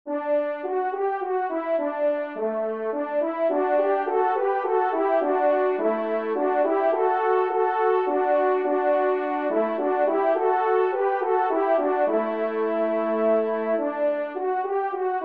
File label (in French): Menuet Do maj, trompe; 2e Menuet; Corrette